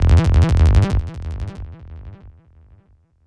SEQ, Hip-Hop & R&B; USB Soundscan vol.47; AKAI

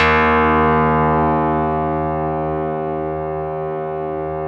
RESO METAL 2 D#2; Music Store

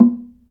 Log Drums, DRY; Roland LCDP11 Africa VOL-1; Wood & Metal

